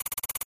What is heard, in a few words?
Wheel Loop; halloween Desktop; Mahi Gaming